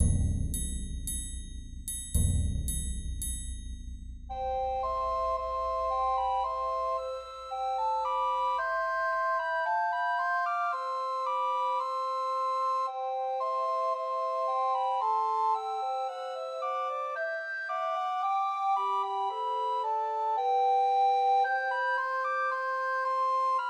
Christmas Trios for Two Descant and One Treble Recorder; Hark The Herald Angels Sing